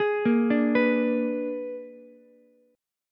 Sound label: A sharp 7th Flat 9th A sharp down Ukulele C6; A#7b9 arpeggiated; JGuitar